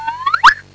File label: LO SLIDE